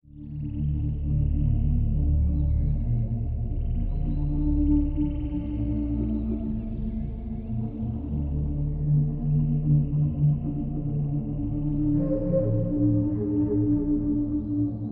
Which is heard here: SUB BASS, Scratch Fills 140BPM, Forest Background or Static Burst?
Forest Background